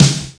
tight lows snare sound c sharp key, Royality free steel snare drum sound tuned to the C# note.; snare drum samples